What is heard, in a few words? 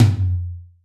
huge tom sample f key; tuned drums f key